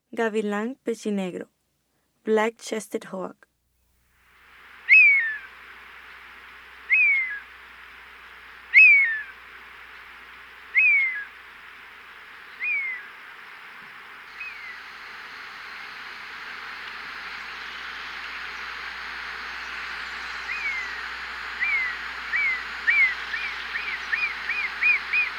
Black-chested Hawk, Birds of the Costa Rican Cloud Forest; N. America; Natures Songs